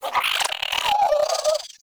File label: Squid Plant Attack Vocal; Objects; Traps